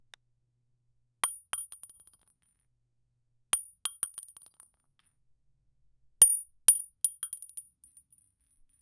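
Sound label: bowling ball striking pins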